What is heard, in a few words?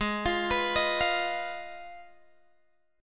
Ab Minor 6th, Ab, Guitar, Open D